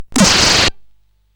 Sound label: Shuffle, CSG8580R5; hvsc